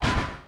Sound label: MC HIT; Crash Bandicoot The Wrath Of Cortex; XBOX